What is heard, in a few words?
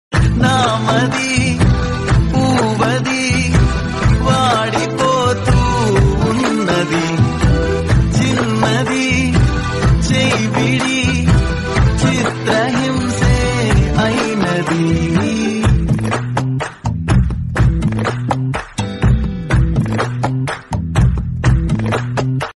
telugu ringtone; dhanush new movie